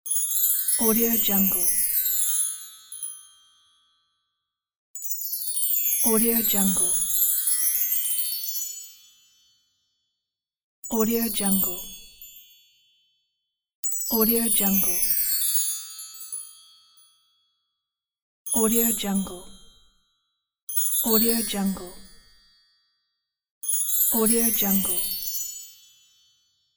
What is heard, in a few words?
tinkling belltree chimes; kazoomx